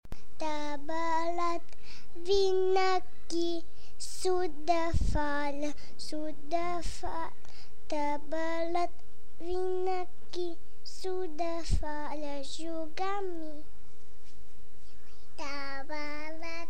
Tabalet

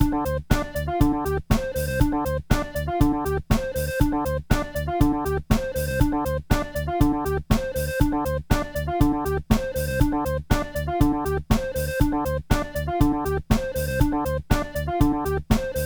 Ebow hack Drums

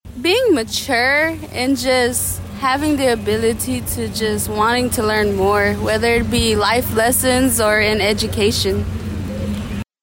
Cadence

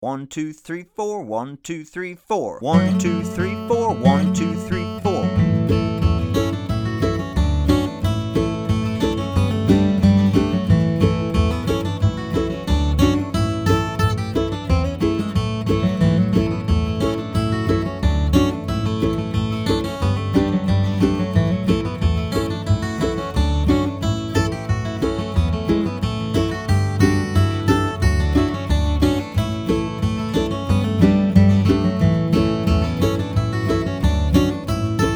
Red Haired Boy Beginner Guitar 90 BPM

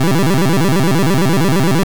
Squaresynth Major Arp

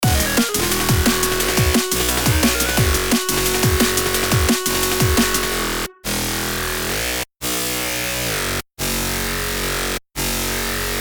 Bass Thing

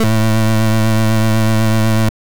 Square Synth Simple Lead

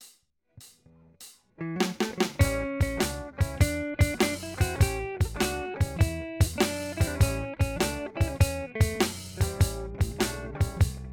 Shuffule

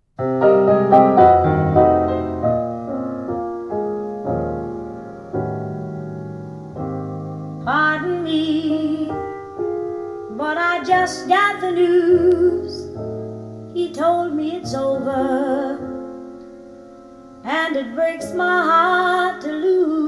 tube NF OFF

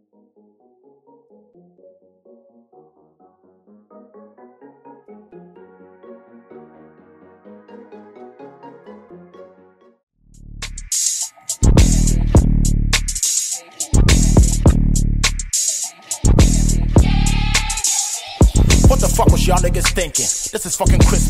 Freestyle; Rapperholic 2021 Announcement

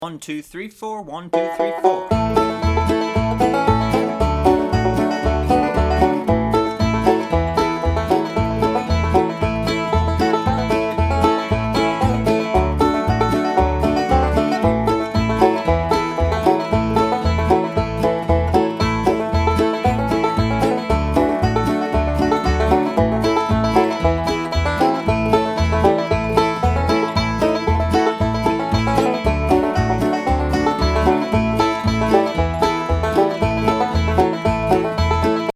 Nine Pound Hammer Beginner Banjo 115 BPM

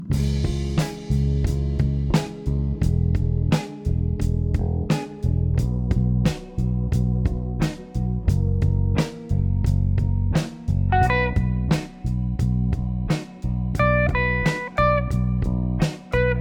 Straight 88 bpm in B

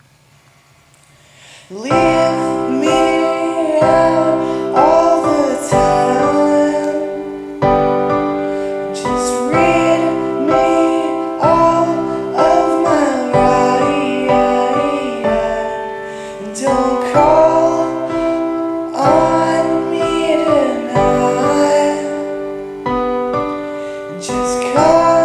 piano alternate version

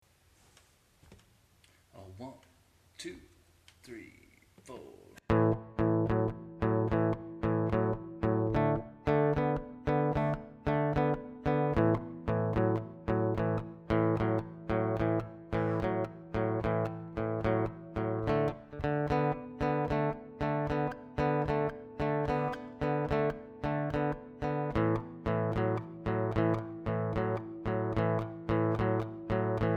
solo Backing

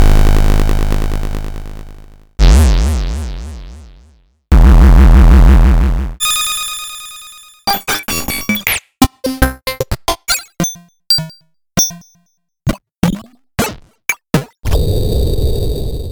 chiptune games and 8 bit kit

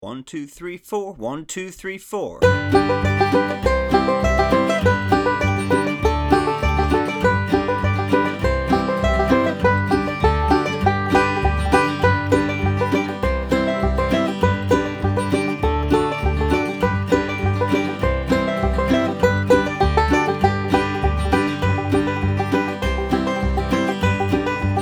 Beginner Banjo 100 BPM; Boil Them Cabbage Down

